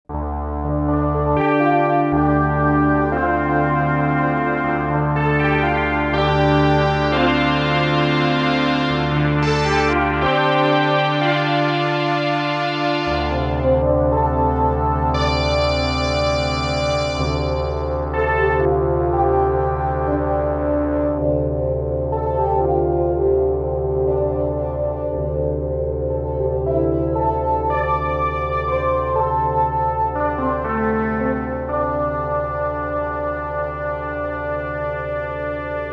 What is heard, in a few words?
Arturia SQ80 V ESQ1 FilterVel